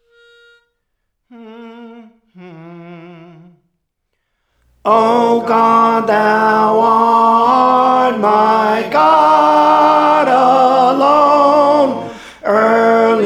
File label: Tenor, Songs; Praise For The Lord Training